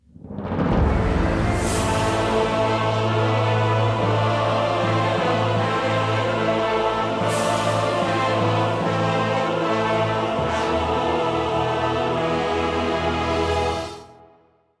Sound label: Play Stinger; Varian Wrynn